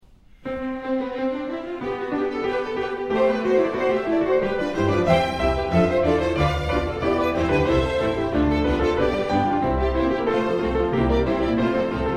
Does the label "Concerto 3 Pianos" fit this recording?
Yes